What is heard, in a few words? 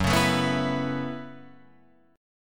F sharp Minor 11th, Guitar, Drop D